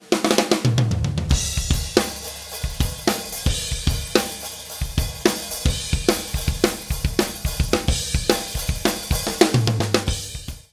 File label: drum phase